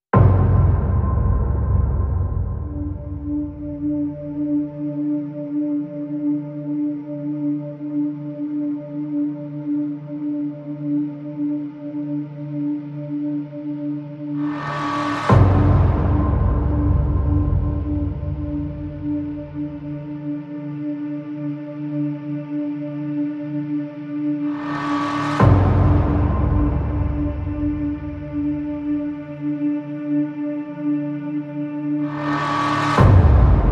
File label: No Foghorn